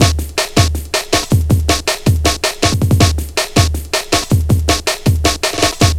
160, Drum Loops; Total Drum&Bass

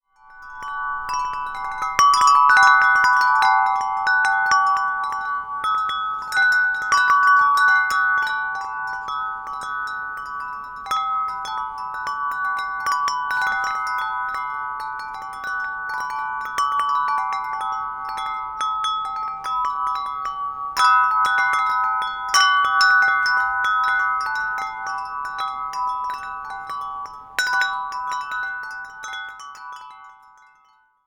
windchimes

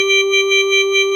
ORG Vox G, Continental Vox Wah Bar; Roland LCDP09 Keys of the 60s and 70s 1

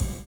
SHUFFLE; Univers Sons

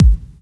BD, Dance Drums

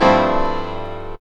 P. CHORDS, KEYS

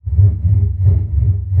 TALK BOW, BASS FINGER; Xsample VOL-5

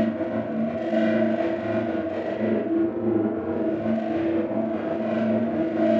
Panned Organ, non tempo loops

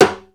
CLSD RIMS, Timbales; E-MU Producer Series Vol. 2 – More Studio Essentials; Composer; Timbales Xswitch

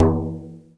Percussion; Sampleheads - NYC Percussionworks

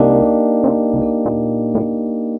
Rhodes Lick; Zero-G Jungle Warfare 1, 2 & 3; 90 s Sample CDs

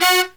LOW HIT, Brass

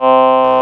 vocoders; Sound & Vision - Gigapack I CD 2

